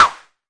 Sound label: Arturia MiniBrute sn